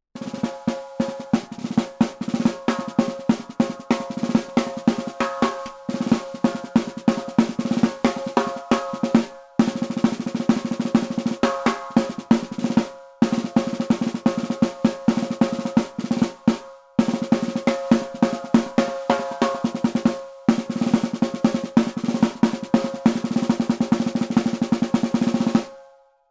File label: Maple Stave